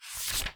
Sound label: paper flip